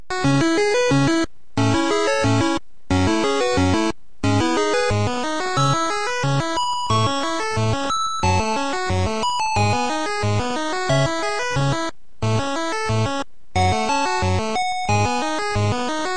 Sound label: Pirate Spy